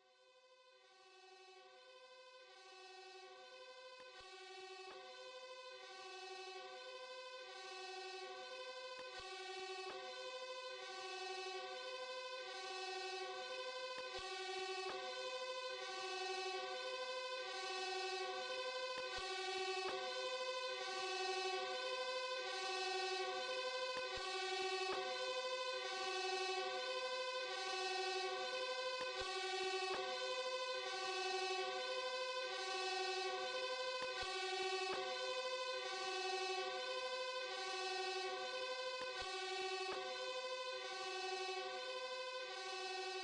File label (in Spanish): sirena